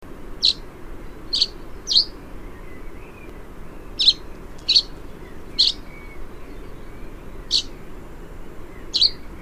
Crag Martin

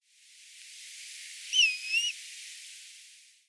Grey Plover

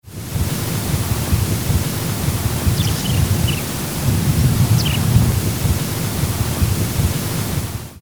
Curlew Sandpiper

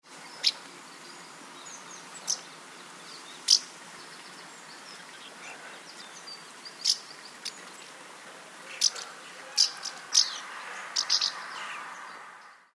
House Martin

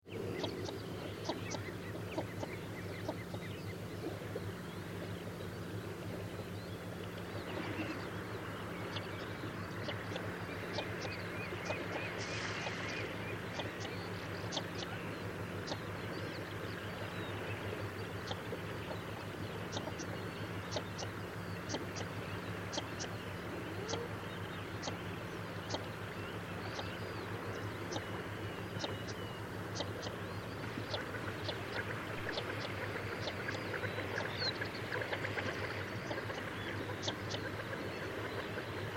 Northern Shoveler

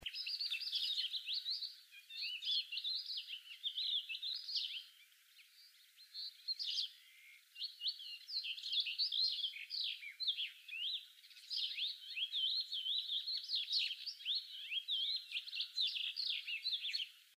Thekla Lark

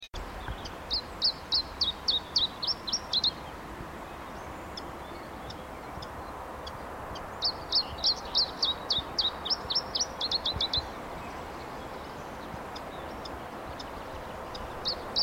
Iberian Chiffchaff

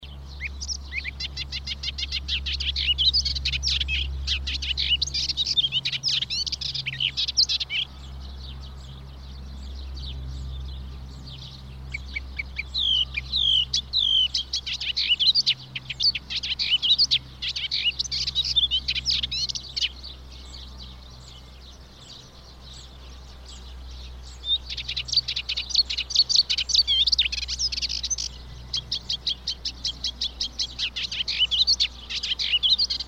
Melodious Warbler

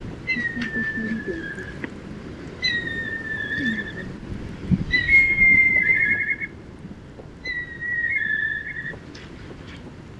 Red Kite

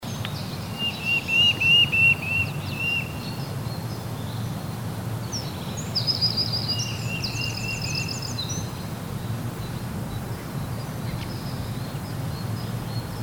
Nuthatch

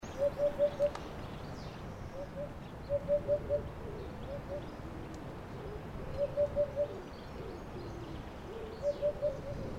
Hoopoe

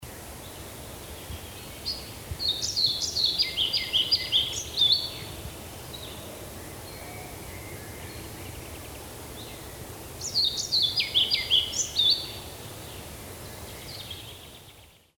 Pied Flycatcher

